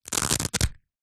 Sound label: wallet velcro open